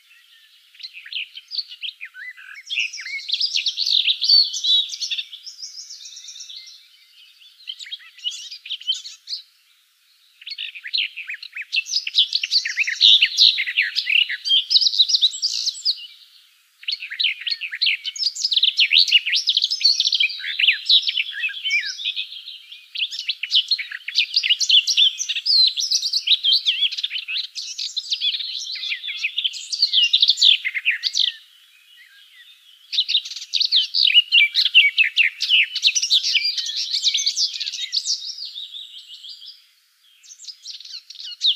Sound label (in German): Gartengrasmuecke; Ornithologie